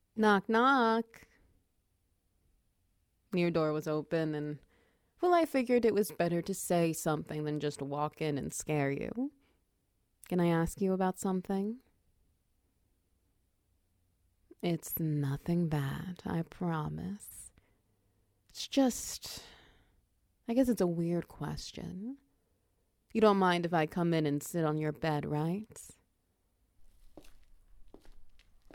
Wet Noises; Her Mysterious Top Donator

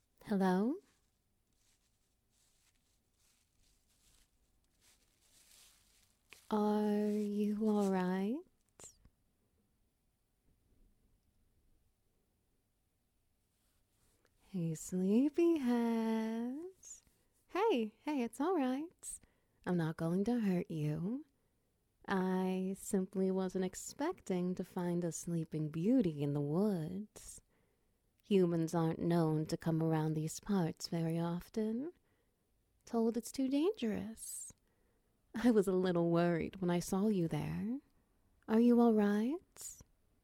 Wet Noises

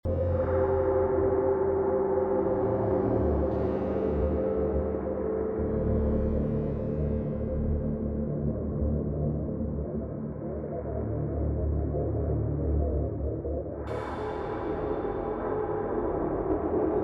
basement night loop

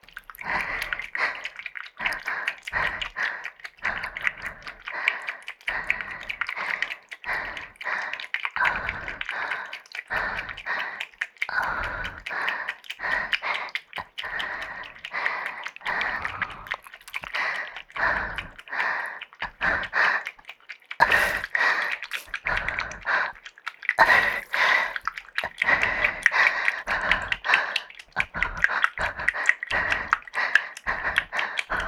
gushy girl solo

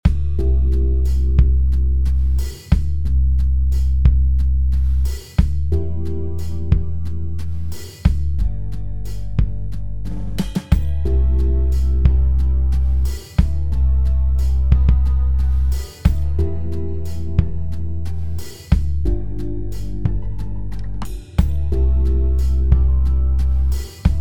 bar music

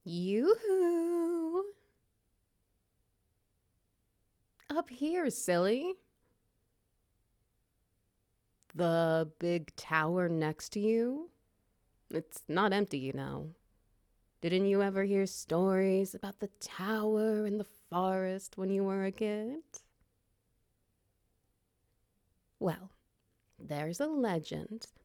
Wet Noises; The Succubus in the Tower

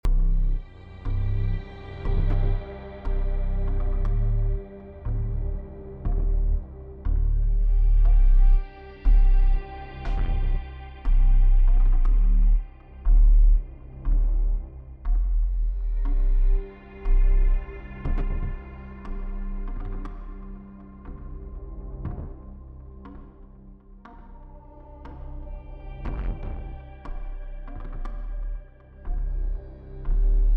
suspense loop; alone

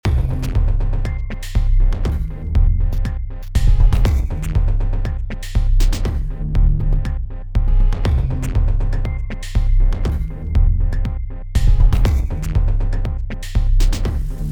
loop attack

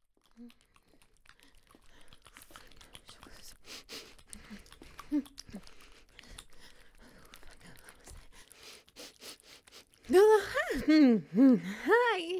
Wet Noises; Caught in 4K

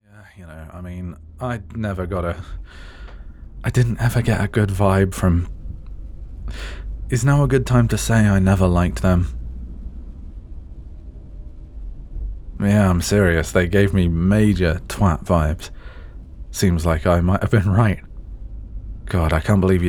Train Station

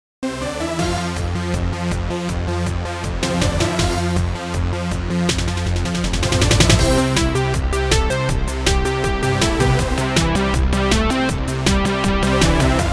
funk version; roadtrip

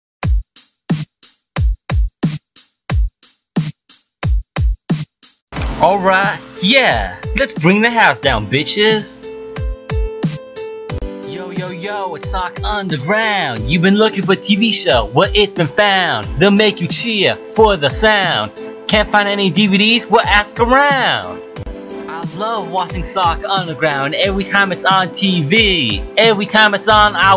rap

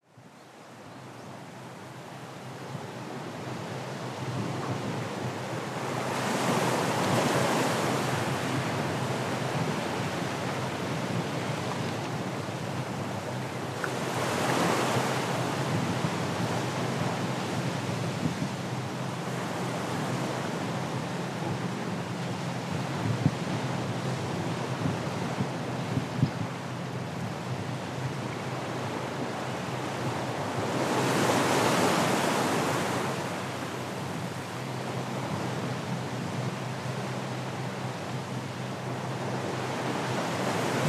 ocean waves

